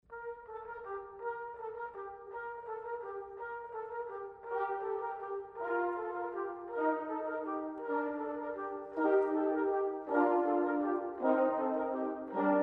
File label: carol bells; C Alan Publications